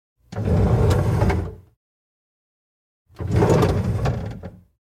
plastikov yshik holod

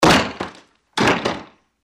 vybivaut dver

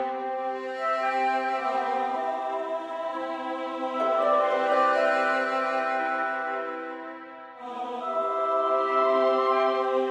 muzyka dlya igr

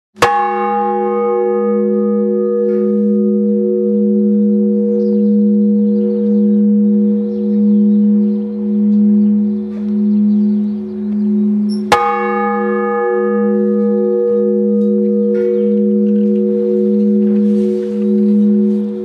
zvon kolokola

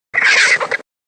zvuk izdavaemyi dinozavrom

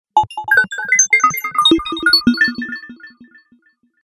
kosmicheskiy zvuk uvedomleniya

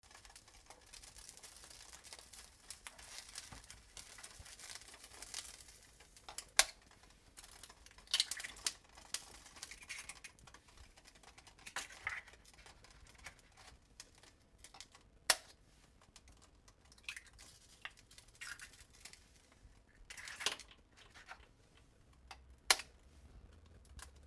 zvuk yaichnicy; kurinogo yaica

